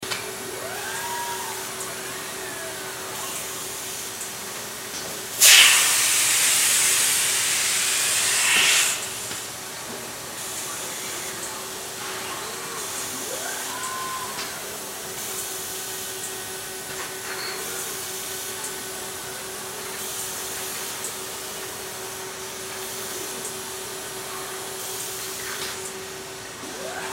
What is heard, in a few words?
Bottle Plant Giant Blender Loop; Factory; Industry; Factories; Sound Effects:Backgrounds; Blastwave